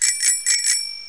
bike hit; beavis; bbloogie

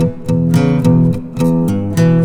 Acoustic Guitars; ACID MUSIC; Poe Dunk

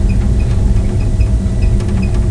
escalator; SINDEMO; environment

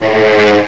PLANE

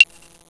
clave

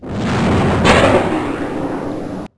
missile; Net Power 1999 #12

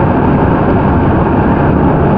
tarmac; ImmersionRacing

